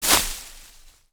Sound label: Light Grass footsteps; gather-and-defend; UltimateSoundFXBundle; Craftelia